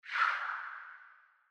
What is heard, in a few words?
chip animation, Sicbo